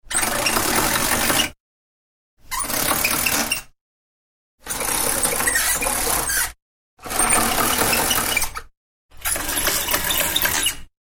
Roller Blind Pack, Various roller blinds being pulled up and let down.; Windows; Household